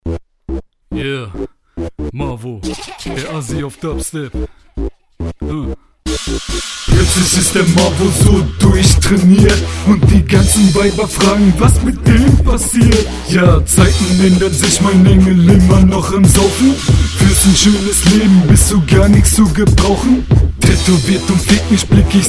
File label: Dubstep